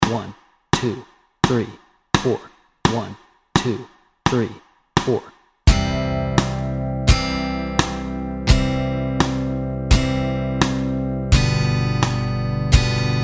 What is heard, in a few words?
Mary Had a Little Lamb Chords Track, guitar